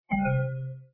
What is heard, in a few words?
Windows XP Hardware Remove